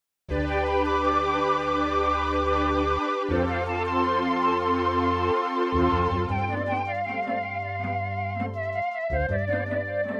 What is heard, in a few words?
Midi Town; Shining Plume 2 Windows gamerip 2017